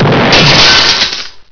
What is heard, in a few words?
SHATTER